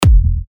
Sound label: 99 E, techno kicks; Rascal; Revealed Techno Kicks Vol. 10